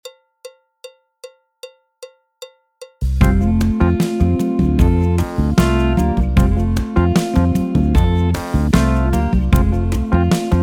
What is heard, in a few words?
alto, trombone, piano; Jazz Syllabus Level 1